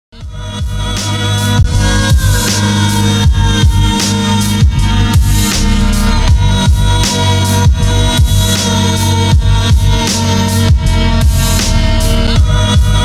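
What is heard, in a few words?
dramatic music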